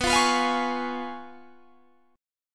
Db 7th Cb, guitar; Db7/Cb strummed

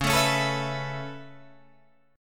C sharp Minor 7th Sharp 5th C sharp Guitar DADGAD

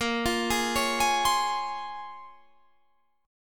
A sharp Minor 9th, down, Guitar, Standard; arpeggiated; JGuitar

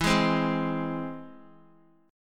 E Augmented E, Guitar; E+ strummed; JGuitar

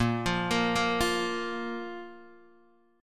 A sharp 5th A sharp Guitar DADGAD

